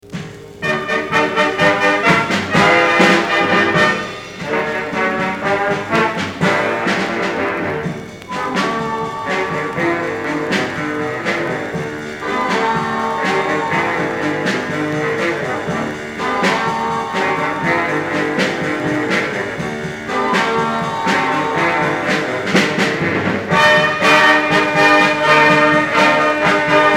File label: Jazz Band; Slinger High Jazz Band